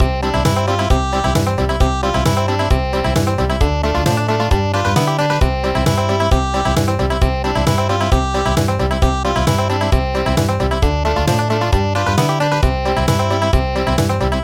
Country

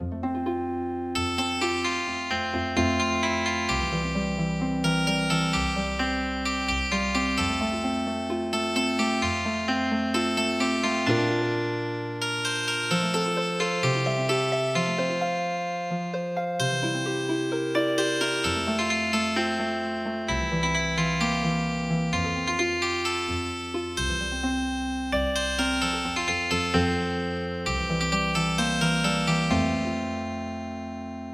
Healing Harp

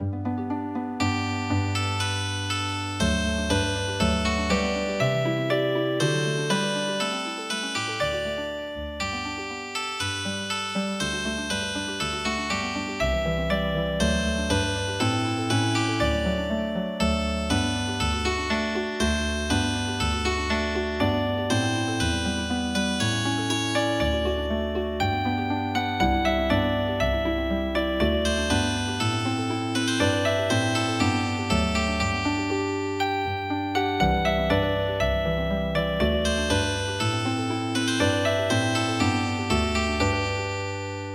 Healing Harp; Emperor

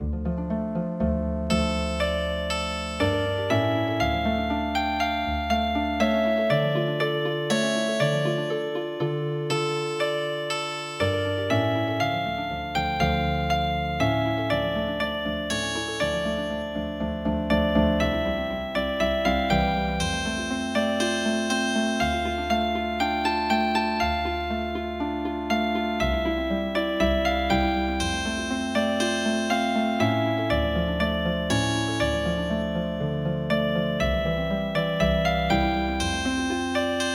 March Healing Harp; midi; traditional

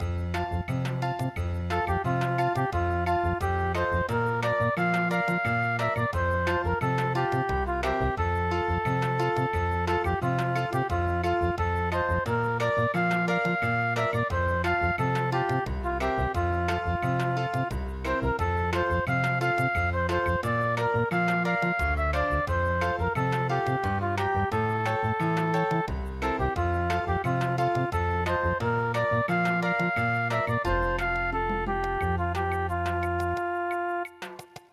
MY LODGING IT IS ON THE COLD GROUND bossa

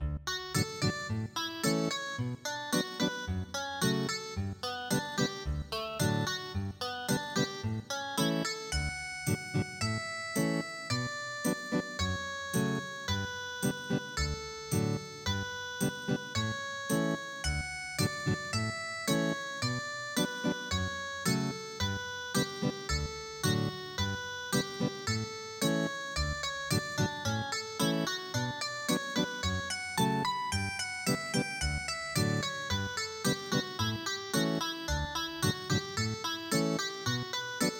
Canon in D, Ethnic Guitar

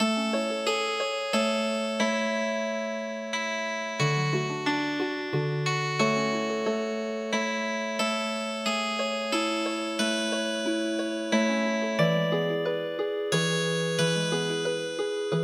Healing Harp

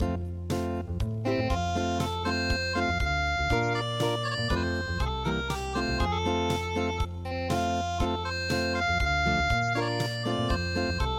unpluged, midi; waits early spring

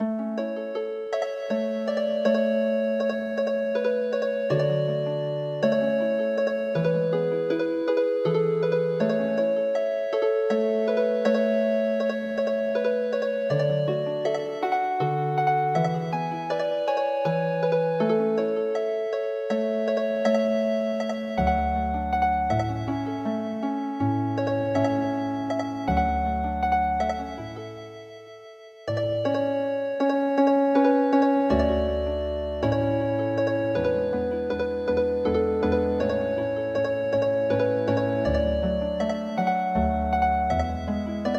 Healin Harp; midi; traditional

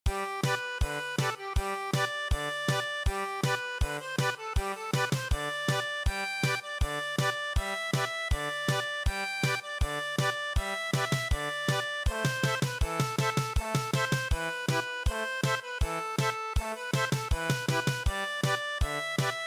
German Polka, traditional

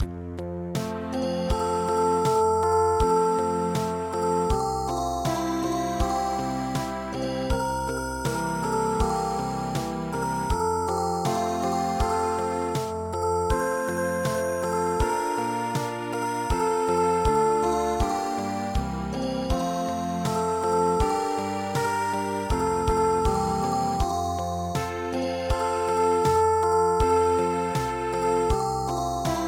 Ballade, midi

